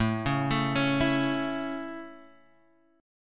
A Major A

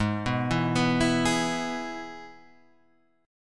G sharp Minor Sharp 5th, Guitar, Standard; G#m#5 arpeggiated; JGuitar

